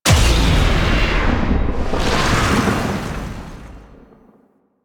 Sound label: Sword Heavy Attack Slam; Enemies; Weapons; DE; Warframe; Teshin